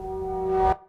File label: gear up; Zaps and Beeps